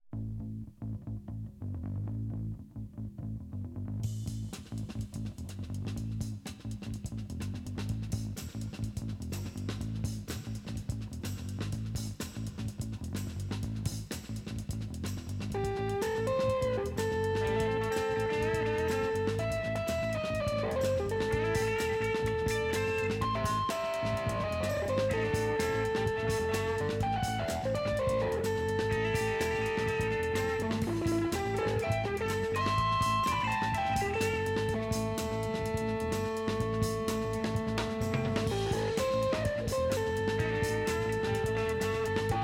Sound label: Music Bed